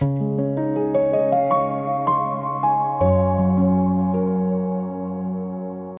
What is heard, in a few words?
piano; stream-io video react sdk